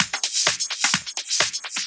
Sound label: FX Loop 128 BPM, House